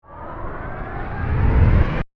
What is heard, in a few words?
Crescendo Ramp, Airy, Short; Crescendos; BlastWave